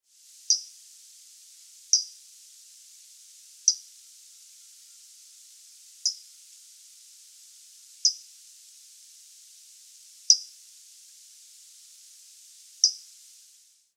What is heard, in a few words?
Basileuterus flaveolus Yaguarete Forest calls